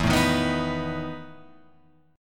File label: E Major 7th Suspended 4th, Guitar, Open F; EM7sus4 strummed